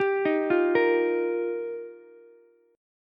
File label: G Minor 7th Sharp 5th, Ukulele; Gm7#5; arpeggiated; JGuitar; 12,15,13,13